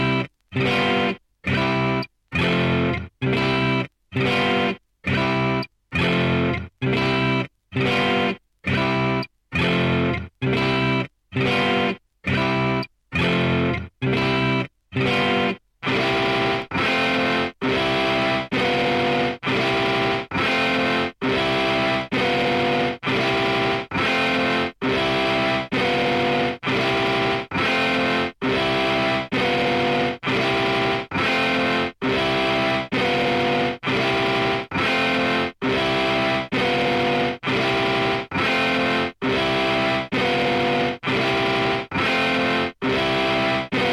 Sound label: Electric Guitar